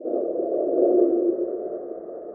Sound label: jetpack; opensurge